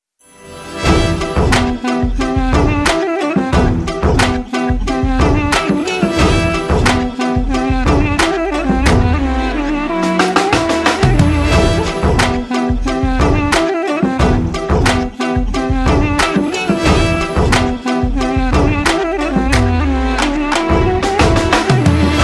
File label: Duduk Armenian